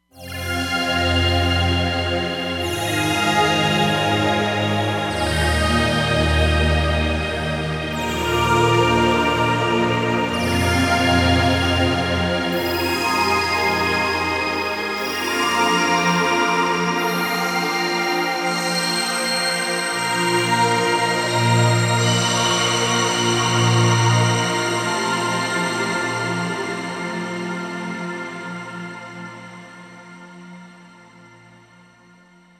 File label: Saturn Siren, Roland Fantom XR